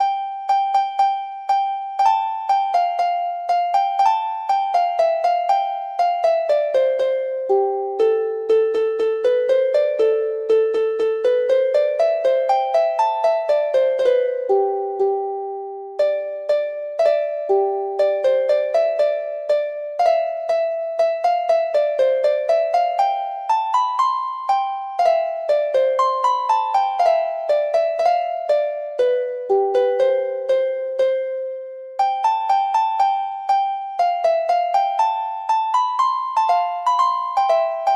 harp

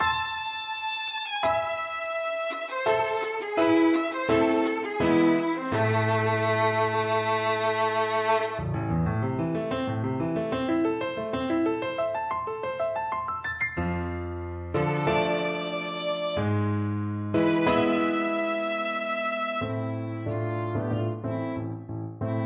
piano, violin; grieg

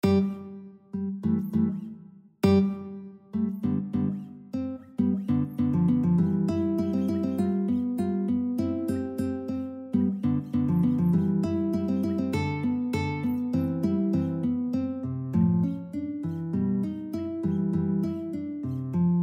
pizzicato polka guitar

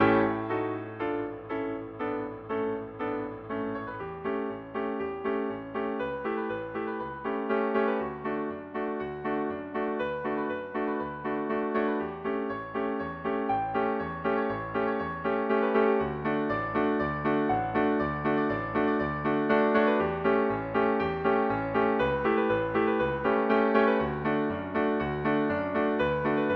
thunder, oboe

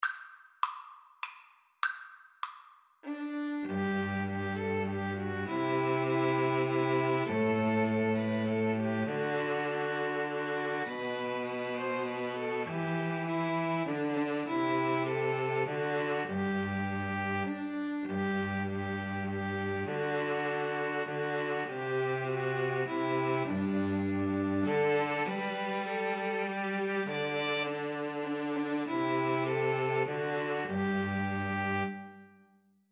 string trio

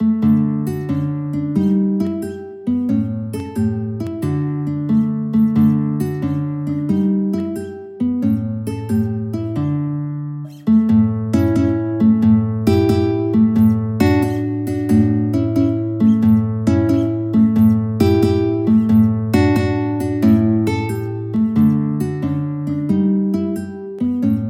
Allegretto In D guitar; Carcassi

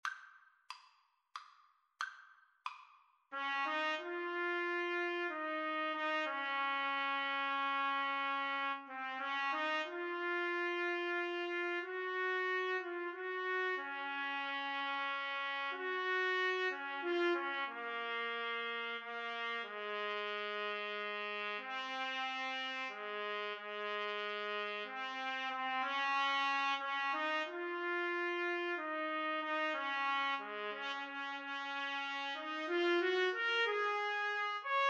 shenandoah trumpet duet

